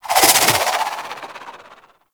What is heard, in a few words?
Charged Proj, Weapons; Warframe; Tenno; Wraith Sidearm; Epitaph Prime